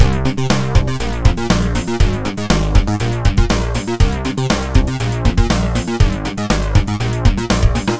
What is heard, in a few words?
Electric 80's, hold music; Gizmo Project